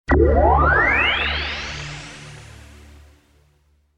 Power Up

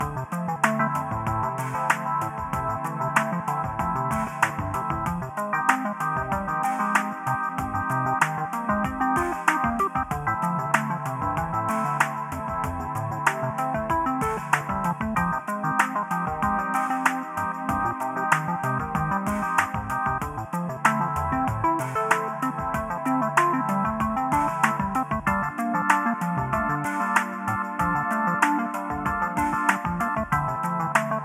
Pixel Balloons, LoFi, puzzle music

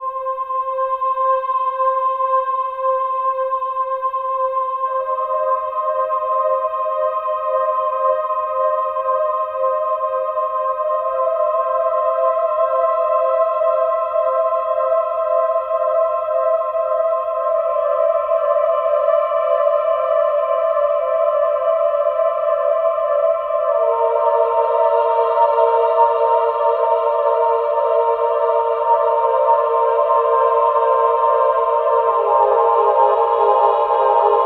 Cold Moon, sci fi

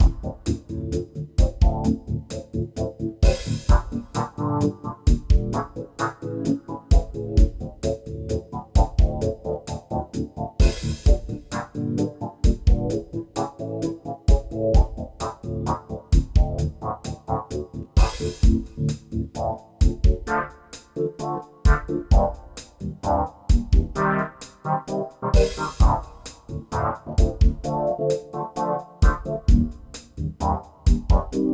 puzzle music

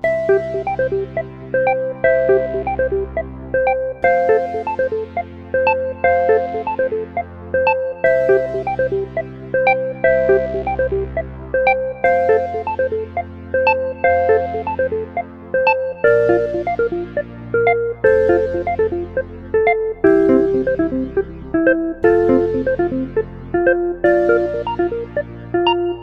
looping music

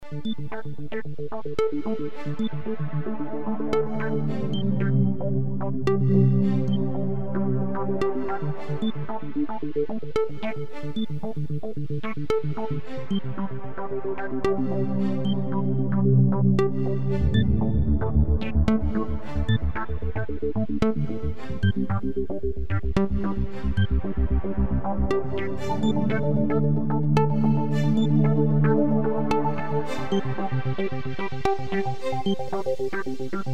Beepage Looping

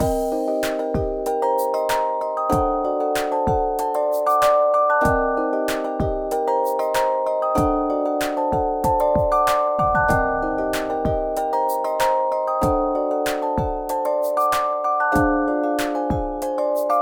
Hypnotic, puzzle music

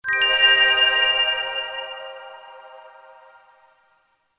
Bell Chord

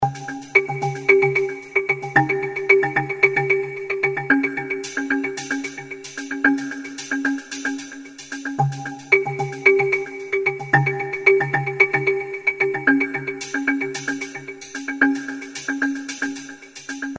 Creepy Rhythm

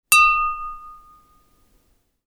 UI Quirky

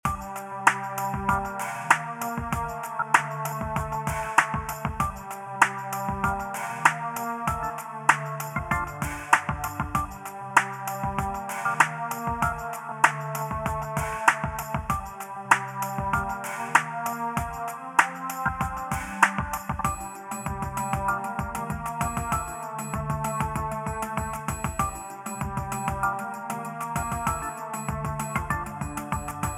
Crime Game Menu LoFi

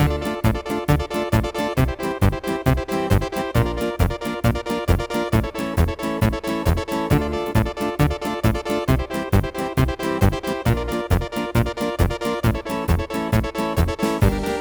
The 8 Bit Circus, chiptunes

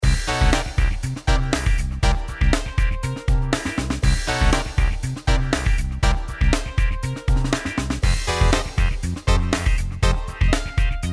Funky Pixel Town

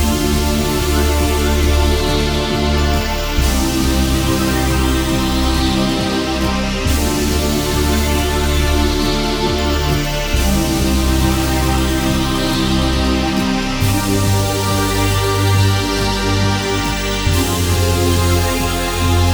Strange Game Menu, fantasy

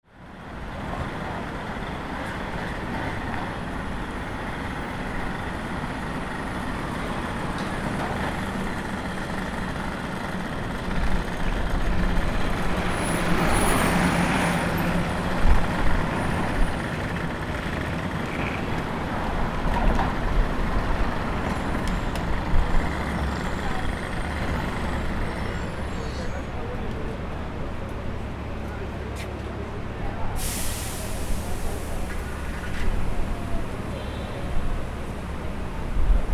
Street Corner

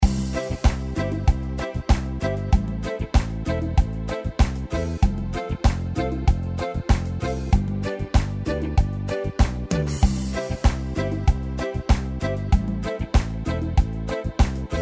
Chug Along Little Engine

